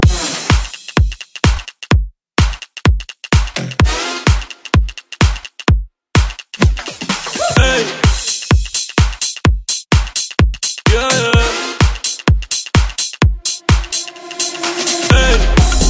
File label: Future House Mainstream Latin Mash Up Mix 126 Bpm